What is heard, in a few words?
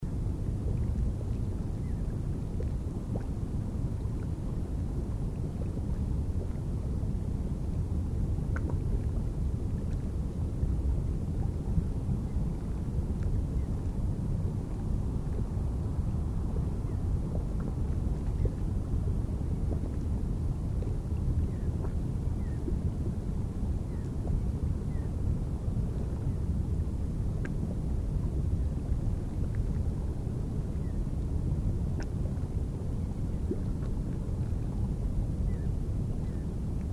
Geyser Hill Near Sulphide Spring Birds Binaural